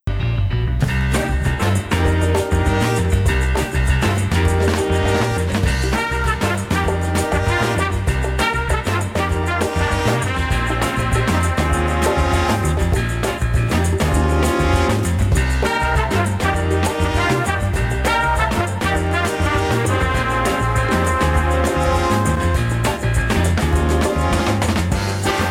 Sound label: Trash Chute Music (Loop)